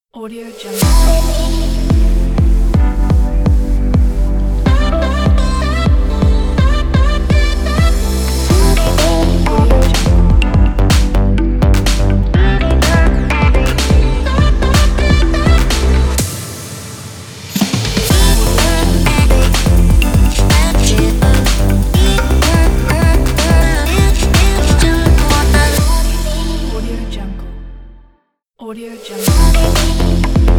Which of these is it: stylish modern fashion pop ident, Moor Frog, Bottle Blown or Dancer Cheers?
stylish modern fashion pop ident